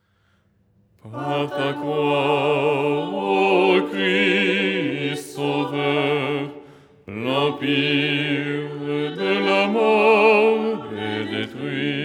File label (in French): basse; ton 3